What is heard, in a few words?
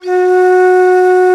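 alto bansuri, World Winds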